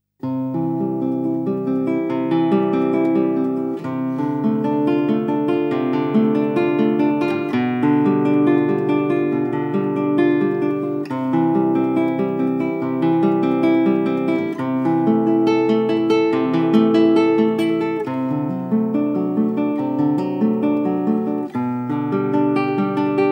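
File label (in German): Präludium C-Dur, guitar; South American music for guitar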